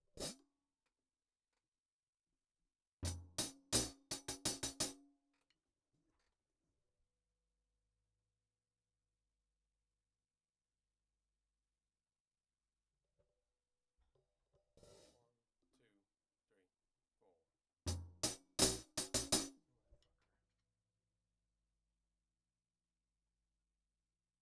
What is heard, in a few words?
hihat